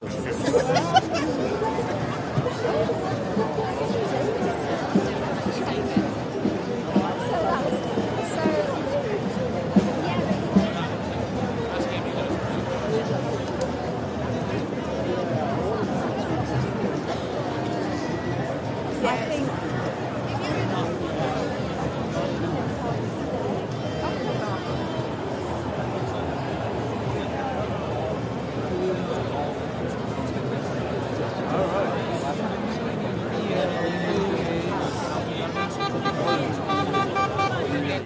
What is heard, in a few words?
atmos protest walking into whistling, london; nhs; london music mixing